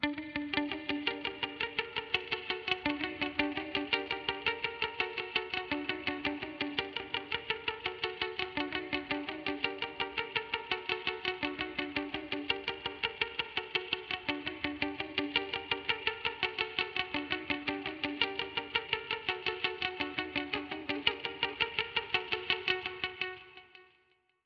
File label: Phrygian Hip Hop Guitar Loop W Delay